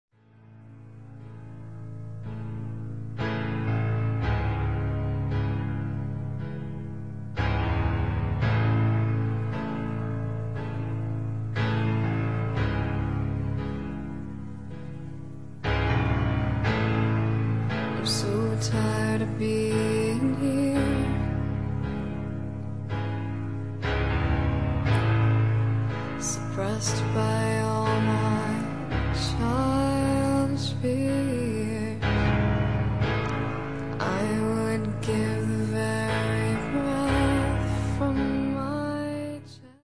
piano vocal

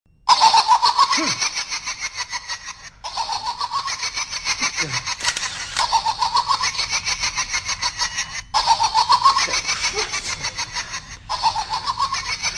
saw laughter

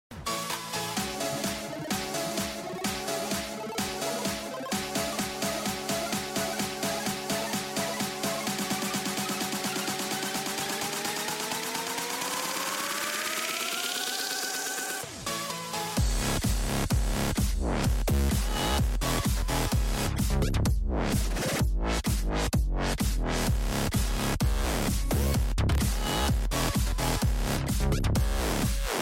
electro house, ringtones; electronic

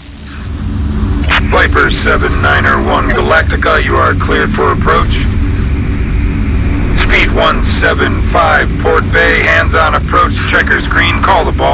raptor landing; ringtones

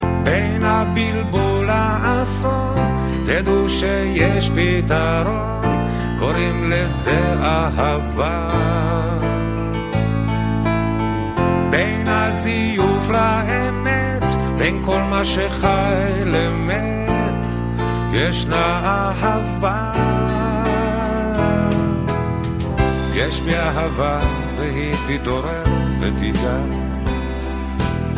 classic music

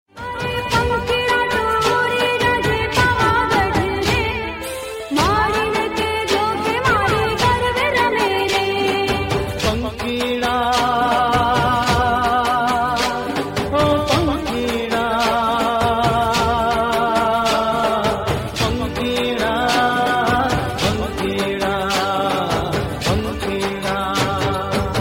navratri tone

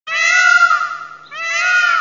peacock; sms tone; animals

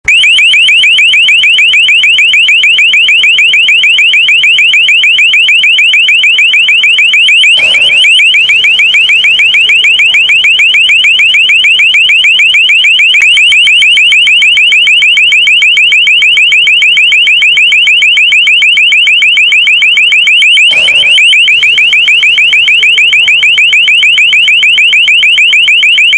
LRAD Sound Effect

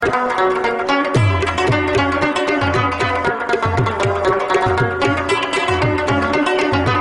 Rubab

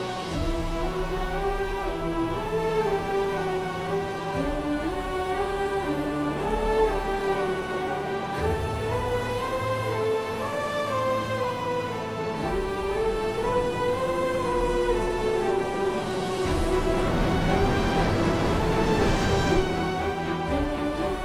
tone

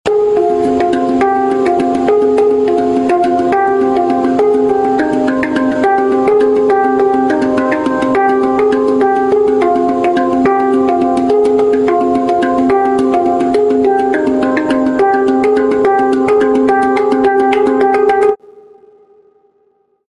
Spacedrum ringtone

